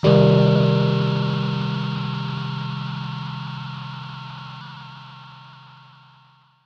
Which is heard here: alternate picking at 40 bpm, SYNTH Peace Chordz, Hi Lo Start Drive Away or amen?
SYNTH Peace Chordz